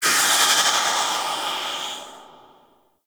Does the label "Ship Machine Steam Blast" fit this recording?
Yes